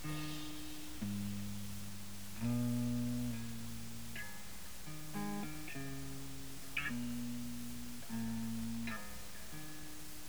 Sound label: instrumental